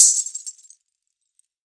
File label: MIXED PERC; 90 s Sample CDs